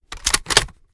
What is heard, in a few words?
kilo98 reload empty bolt open; gmod; viper